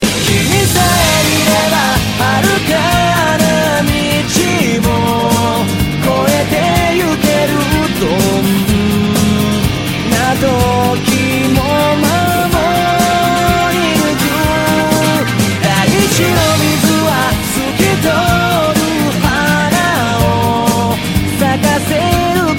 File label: japanese cartoon ringtone